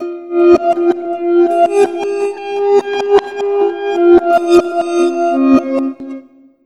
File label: SSTRINGS, REVERSE FX; USB Soundscan vol.60; Asian Traditions; AKAI